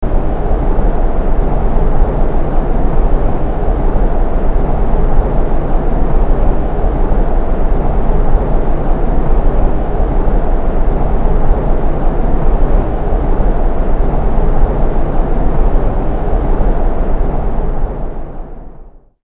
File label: Desert Ambience; Tumbling In The Wind; The Legend of Mata Nui; Game Music; Console Games